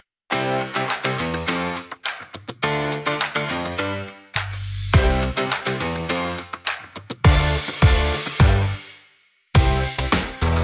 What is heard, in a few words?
Instrumental